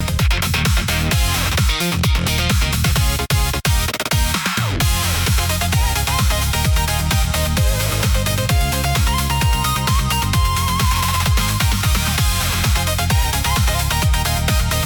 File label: loop; bgm; opening